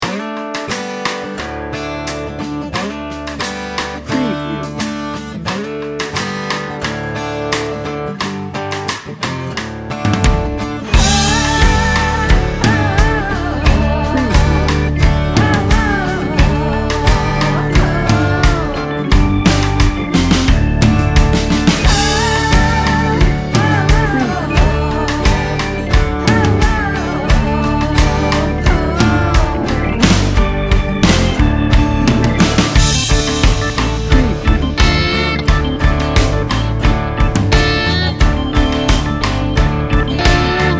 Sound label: swamp jam